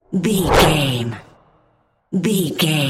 bullet fly by designed

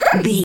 goblin fairy attack low

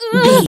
voice female hurt pain

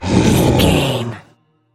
troll monster effort growl small

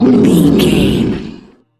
troll monster battle groan

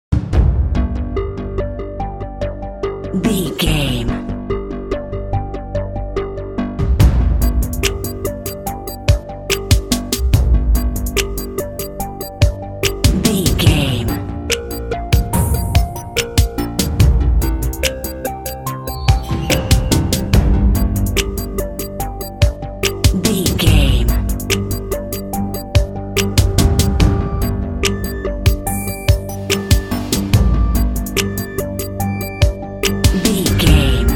dramatic decision countdown alt

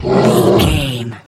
troll monster attack slow

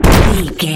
rock impact spike trap

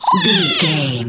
goblin fairy attack fast

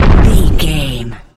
rock impact heavy slam

